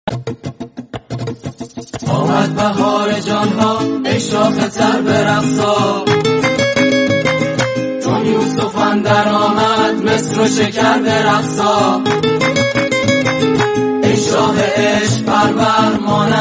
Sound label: Guitar